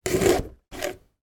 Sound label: pumpkin cut knife halloween; foley; sound effects; sound effects one